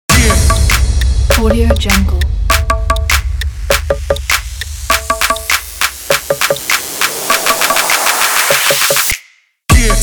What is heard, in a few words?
hip hop sport wild